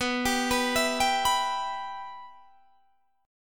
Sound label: B Suspended 4th Sharp 5th B Guitar DADGAD